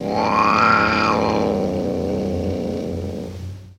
Cat Angry Growl

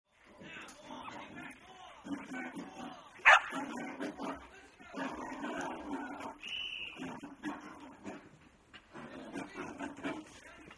Rodeo Bull Grunts, Trainer Yelling, Dog; Bull Grunts, Trainer Yelling, Dog Barking, And Whistle Blow.

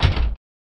Car Door Slam Int Hit

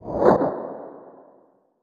Computer Screen Appea, stinger

